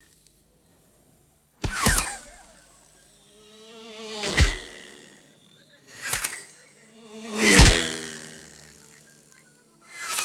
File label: buzzing arrows flying past and landing